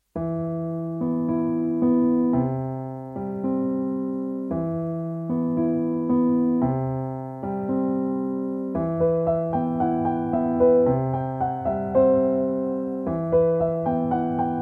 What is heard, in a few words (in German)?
Ein Tango voller Sehnsucht, Endtempo